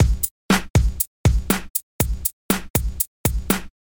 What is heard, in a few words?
Hip Hop Music